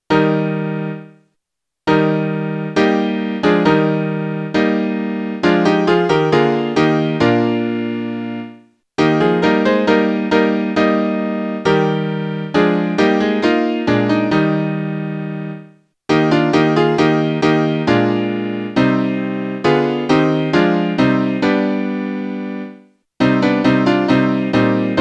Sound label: A toi la gloire piano; 4 voix